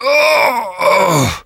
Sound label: death; dukenukem